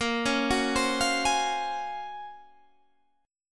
Gb Major Sharp 11th Bb, guitar

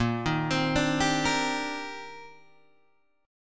C Minor 9th Bb, Guitar Standard; Cm9/Bb arpeggiated; JGuitar

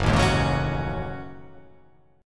Gb Minor 6th Add 9th Gb down; strummed; JGuitar

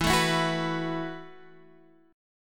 E Suspended 2nd Suspended 4th, Guitar Open D; down

